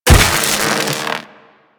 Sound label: Electro Prod Hit Flesh; Warframe; Weapons